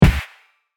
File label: punch